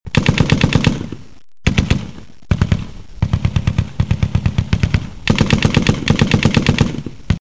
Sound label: machinegun